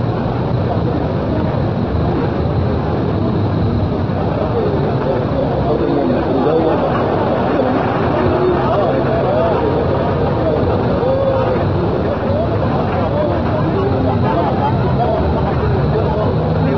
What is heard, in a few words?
traffic voices